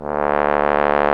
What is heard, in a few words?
Solo Brass, Tuba